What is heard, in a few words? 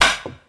nineties steel snare drum D key; snare drum samples